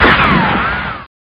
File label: shot